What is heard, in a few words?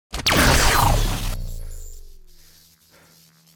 hit, sword; bane